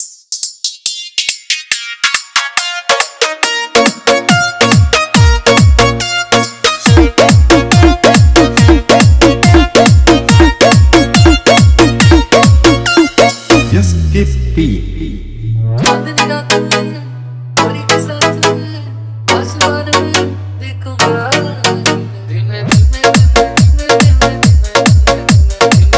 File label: Bollywood Electro Mix